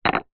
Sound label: broken piece concrete block movement drop; zapsplat